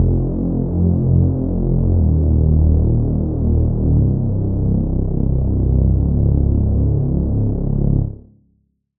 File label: Reese; Routed Sound; Serum 2 Expansion - Street Vanquisher Vol.1